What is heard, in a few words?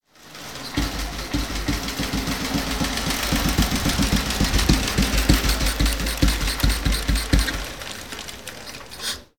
Triumph Model P